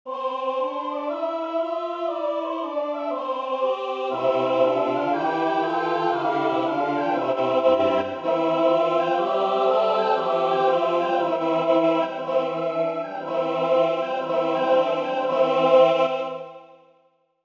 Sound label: fuga